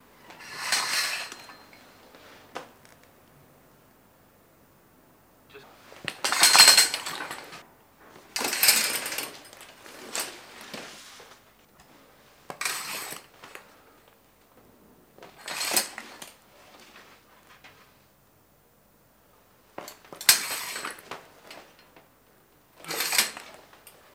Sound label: Shower Curtain Pull